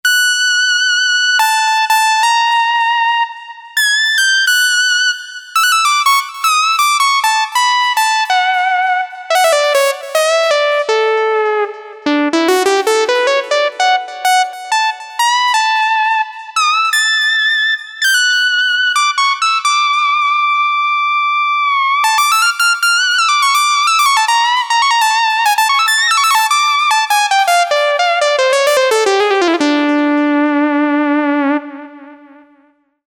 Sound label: Arp Pro Soloist